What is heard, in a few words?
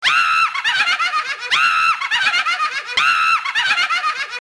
witch; halloween